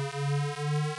Restore Up; Red Baron

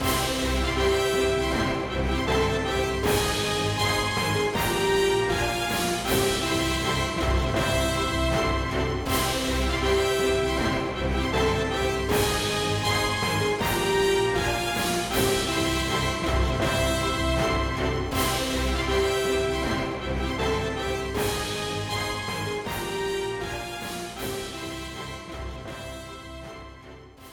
Music Castle Victory; lego brawls gamerip 2022

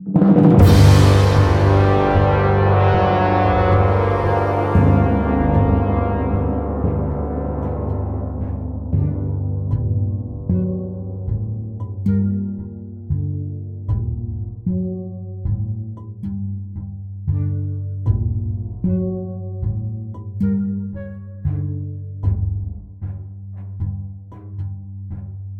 Defeat; Alchemic Jousts

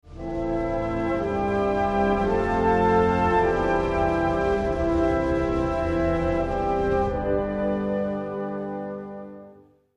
win jingle; Japan

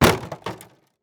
plastic break

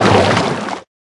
Movement swim Switch lane; Tom swim

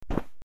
Land; Tails Nightmare 2

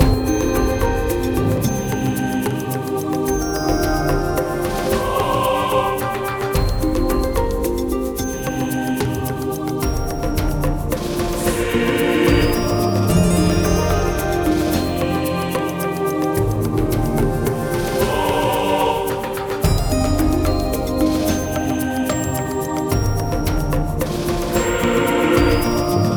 Garden Idle LOOP; Forced Showdown

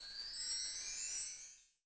health sparkle

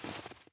snow step

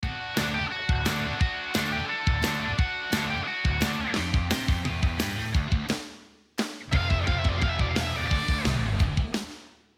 MUSIC Band play; Equestria Girls

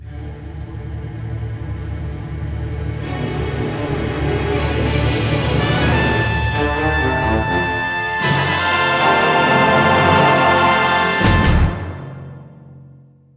Cinematic Plant Win Generic

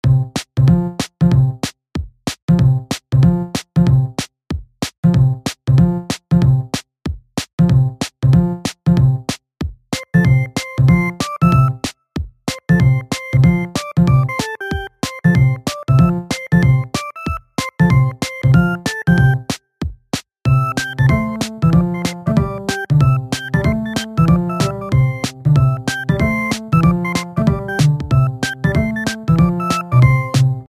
Gameplay (Speed 4); Dumb Ways to Die 2 The Games; Android

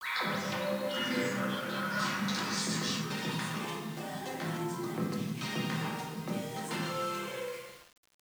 karaoke; Yakuza Ryu ga Gotoku Kiwami 2; usen karaoke

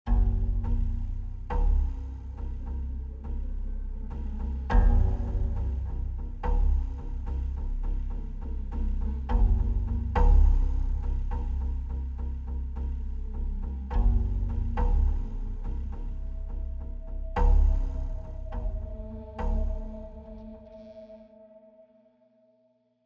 Tension Mood; the last of us

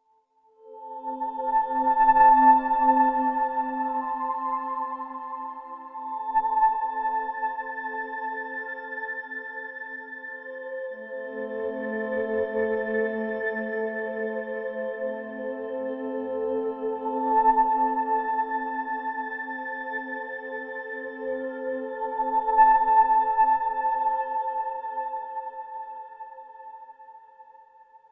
Overlay Drone

